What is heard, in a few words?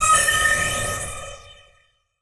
Transition Whoosh Laser